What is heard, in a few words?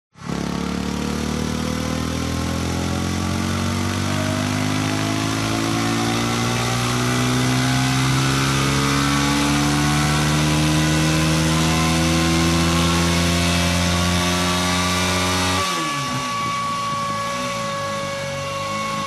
MOTORCYCLE Engine Accelerate Fast Howl; TRANSPORTATION; smartsound